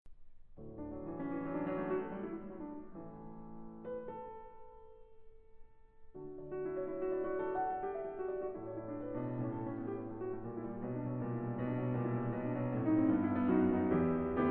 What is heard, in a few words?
piano